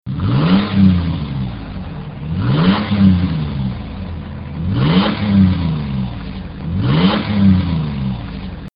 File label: 4Runner 4.0L Single